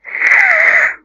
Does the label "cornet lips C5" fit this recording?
No